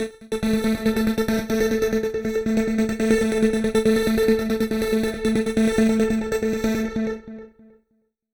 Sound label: HARD SYNTH 140 A, Hard Trance, Keys